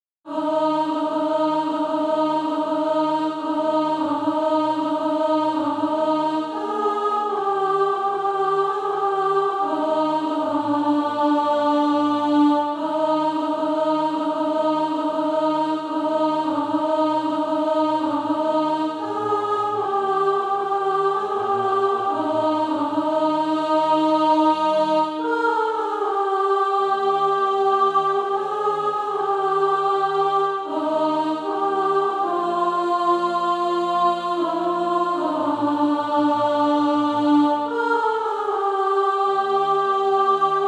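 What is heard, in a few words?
alto, SATB Practise Music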